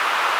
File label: Skid Sand; LFS